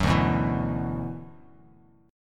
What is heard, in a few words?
D 6th Add 9th D, Bass Standard; D6add9 strummed; 14,5,9,9; JGuitar